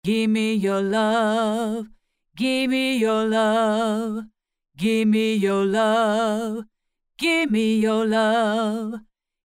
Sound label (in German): Gesangspassage, Mit Reel ADT, Preset: Classic Vocal ADT; Waves Abbey Road Studios Reel ADT; Ueberschall The Voice